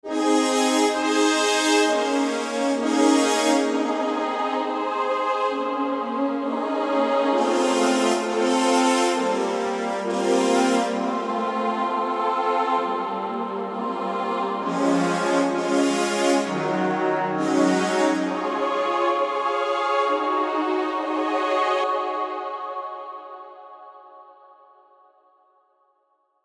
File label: Brass Strings Echo